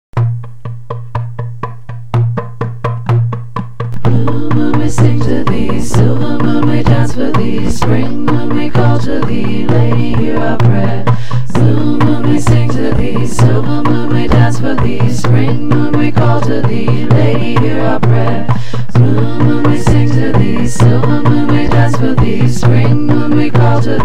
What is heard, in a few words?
Blue Moon Chant